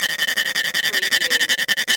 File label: CARTOON SAWING